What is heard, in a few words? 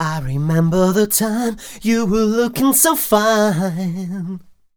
m 128 e-flat, Vocals